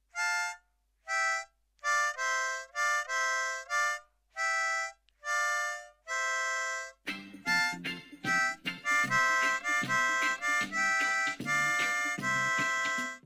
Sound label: 10 hole harmonica